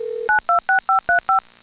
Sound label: dtmf A5